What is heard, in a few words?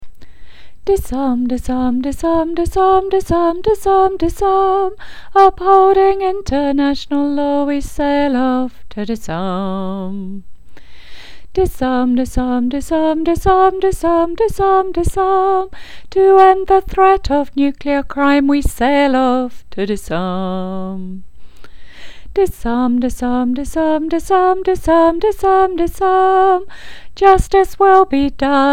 maytime mermaids chorus alto